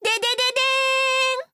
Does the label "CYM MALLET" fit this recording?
No